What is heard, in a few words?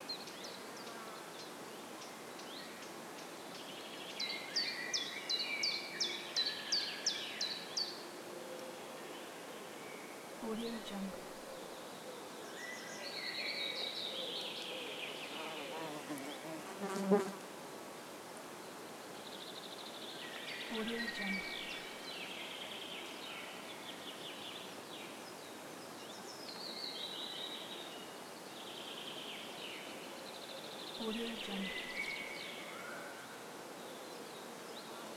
forest birds insects